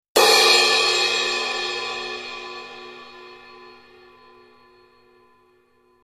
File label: crash; otoya